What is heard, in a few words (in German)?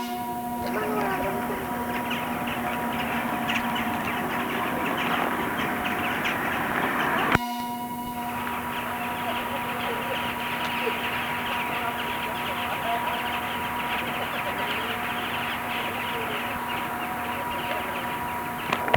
birds im stadt